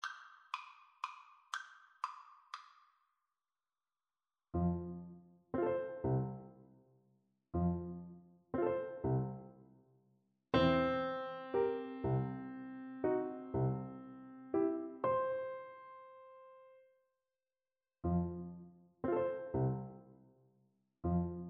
delibes valse lente FL kar, Flute

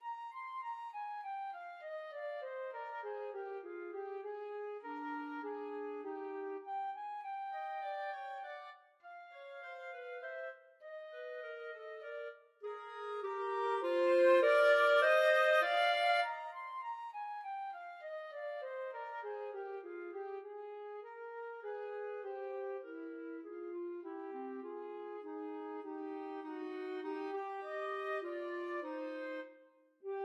flute clarinet duet

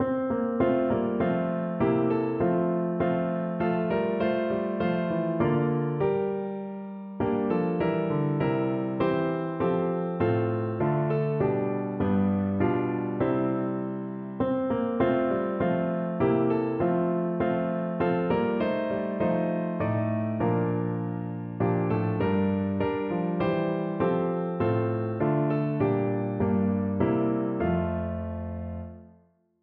piano

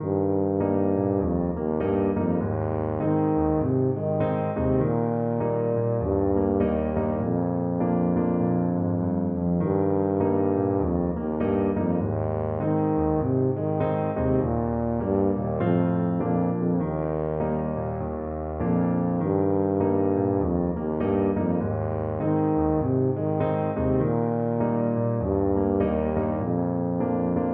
tuba

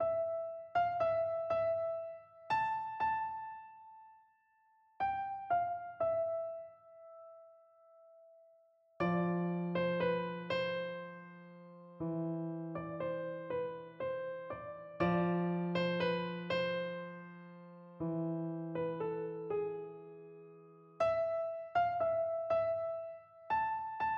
Adagio Sonata in F K280, piano

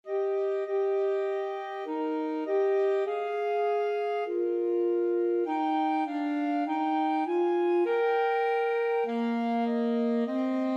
o come, clarinet saxophone duet

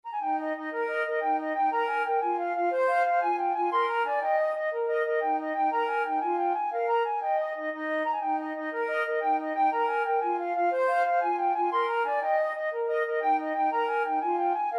irish jig medley FL duet, flute duet

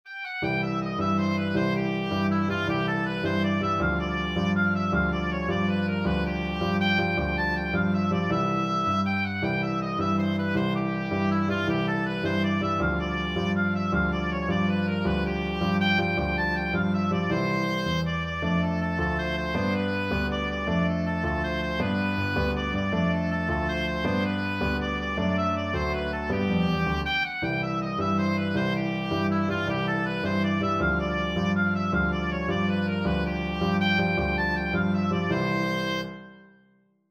mexican hat dance, oboe

